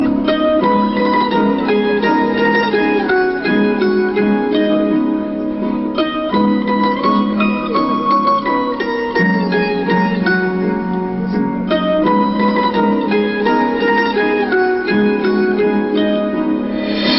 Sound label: Slow Teenmar Music